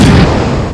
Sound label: spike, weapons; dmc